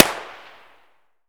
pb distant; Escape from Tarkov

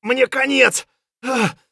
hurt near death; Escape from Tarkov; scav4

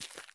walk asphalt; Escape from Tarkov

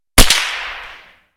Inf Weapons; Red Orchestra 41-45

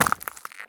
sprint glass; Escape from Tarkov

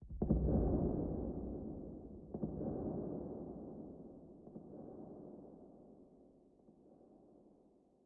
ambient; World of Tanks

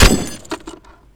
bmp 30mm metal

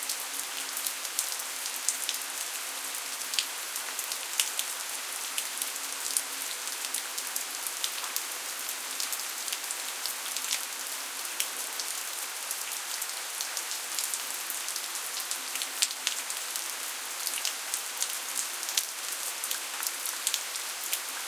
Rain Drops; DCS

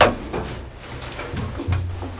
Vehicle reloads; Red Orchestra 41-45

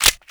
colt1911 reload clipin

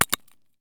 grenade collision flash russian; Escape from Tarkov

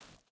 footstep barefoot gravel jump start; Rust

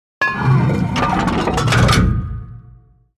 gun reload; World of Tanks

